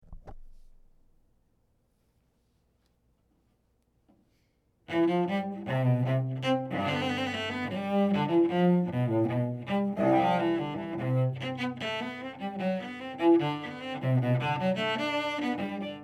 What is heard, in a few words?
Bouree from Cello Suite, cello; J.S. Bach